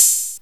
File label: HAT